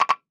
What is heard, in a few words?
Error Tone